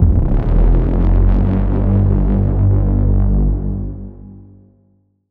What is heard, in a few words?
braams; Cinematic SFX sound design; Sample packs